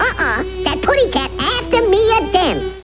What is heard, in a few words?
tweety